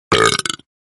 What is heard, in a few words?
burp sound effect